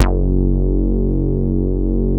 MOOG PRO, BASSES; Masterbits - Vintage Voltage; VV BASSES

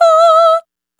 Stigmata Dry, vocal samples; Sonic Foundry (Sony Creative Software) - Pocket Diva World Class Vocal Samples; Op-Erratic Loops

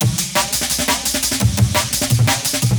Rock FX, Loops, breaks; M8

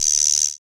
CR-78, ANALOG; Sound & Vision - Gigapack I

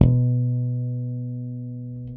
Harmonic One Shot B, Bass; Bass X

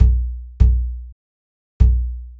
Fat Box, percussion; Sonic Foundry (Sony Creative Software); Joe Vitale Latin Percussion

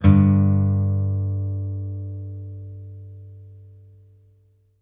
5ths G2, Blues Guitar; ILIO; Fretworks

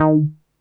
NASTY B, BASSES; Masterbits - Vintage Voltage; VV BASSES

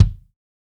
Gretsch Kick; Sonic Foundry (Sony Creative Software); Vital Drums

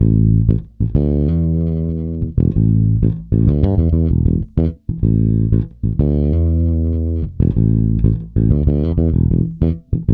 A, funk, bass; Spectrasonics; Bass Legends

